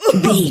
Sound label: voice female hurt pain low